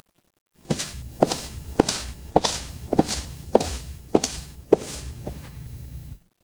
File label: footsteps concrete